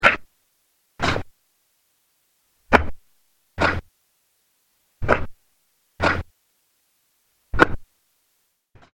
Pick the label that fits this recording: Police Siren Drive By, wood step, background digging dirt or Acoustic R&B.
background digging dirt